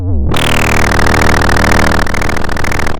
pop, chainsaw

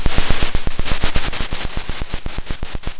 pop, Sound of a drum roll

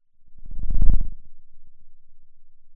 pop

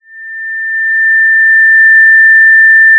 Sound of a police car siren

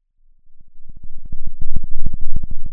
pop, Sound of a thunderstorm

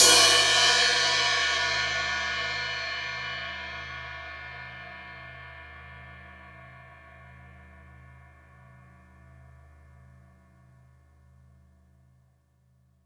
Crash, drum

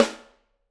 Piccolo

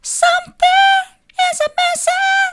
something is missing soft, vocal

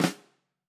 Flam, drum

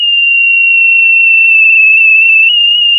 pop, sound of a tea kettle whistling

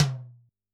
Tom, drum

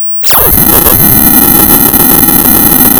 Sound of tearing paper, center

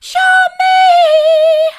mid, vocal; show me

